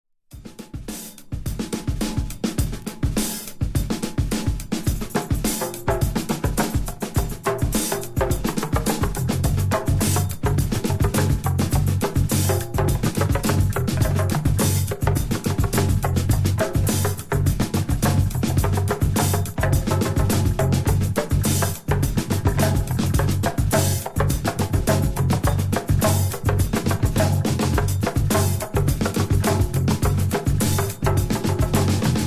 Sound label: dueling drums